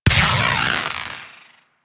Charge Shot, Weapons; Warframe; Moa Pet; Vulcax

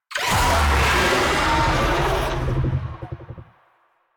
Infested Oni Vocal Attack; Warframe

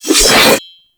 Lunge Swipe; Warframe; Stalker

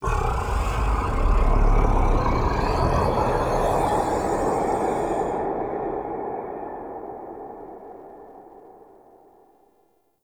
Sky Breaths, Ambience; Warframe; Duviri

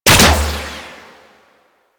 Antler Bow Fire; DE; Warframe; Weapons

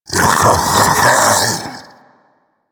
Infested Splitter Split Vocal Alerted

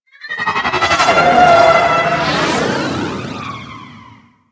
Fighter Ship Attack Maneuver, Engines

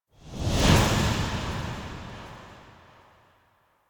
Camera Spot Stinger Out; Xeto; Warframe; Jade Shadows; Quests

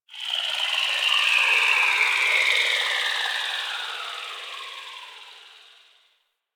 Gas City Distant Textures, Ambience; Warframe; Corpus Gas City

